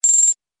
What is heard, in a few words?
alarm clock